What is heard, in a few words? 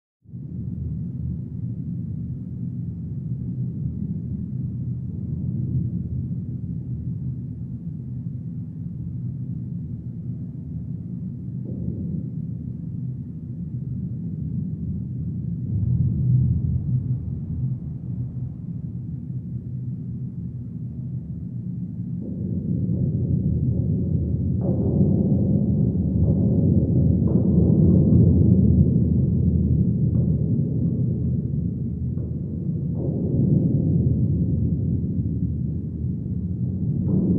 Evil Gallow Ambience; Low Evil Bassy Ambience With Strange Deep Metallic Banging Sounds; Animal Vehicle Growls